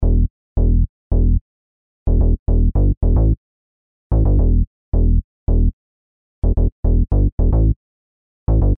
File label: kick bass